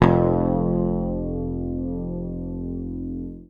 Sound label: PICK, Bass Library